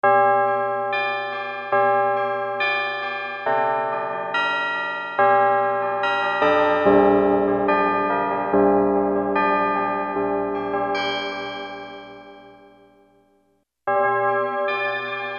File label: ENSEMBLE REVERB, Boss SX-700; Factory Preset